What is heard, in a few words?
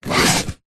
foley cardboard box flap move fast